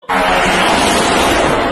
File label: explosive church fart